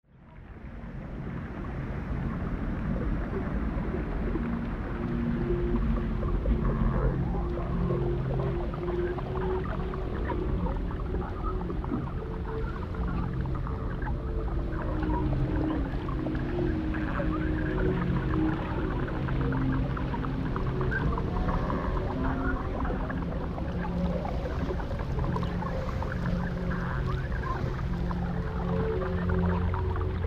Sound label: Scientific Sounds Music for Better Sleep Healing; meditation